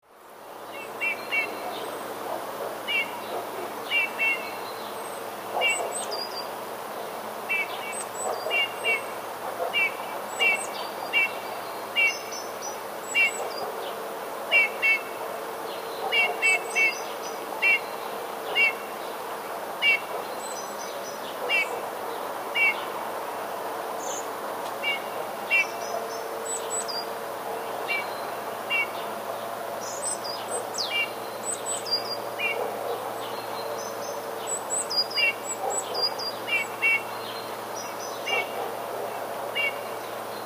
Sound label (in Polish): gile